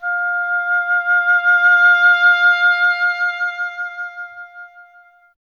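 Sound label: oboe, wind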